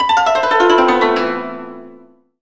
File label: koto gliss; AKAI S-Series CD-ROM Sound Library VOL-7